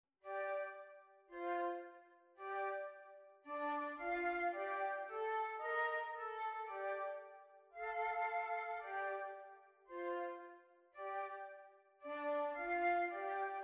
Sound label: Groovin Flute, flute quartet